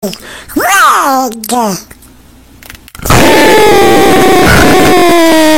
aaaaaaaaaaaaaaah

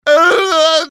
uwu

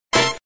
trumpet

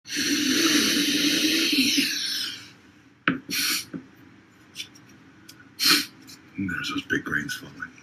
big grains falling

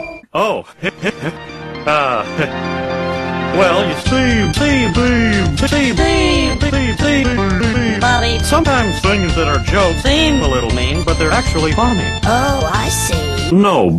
ringtone

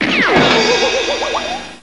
cartoon confused

